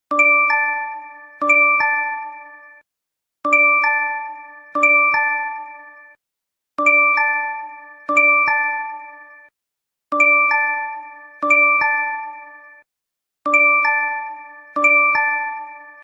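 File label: LINE message, 着信音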